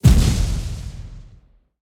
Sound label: impacts; World of Tanks